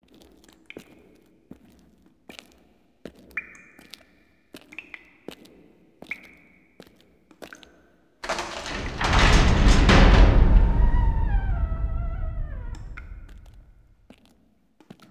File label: new dungeon